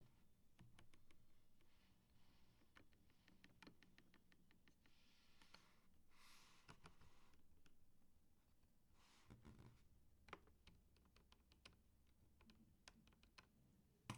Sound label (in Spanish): Estabilizadores Logitech G PRO X TKL